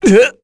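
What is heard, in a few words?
Vox Damage, korean; Esker; kingsraid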